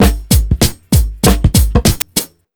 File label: BEAT, Underground Hip Hop